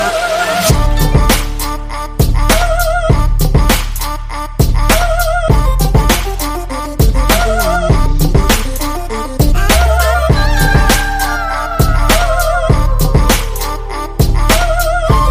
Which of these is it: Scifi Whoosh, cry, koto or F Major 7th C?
koto